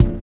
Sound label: VIBRA; Spectrasonics; Metamorphosis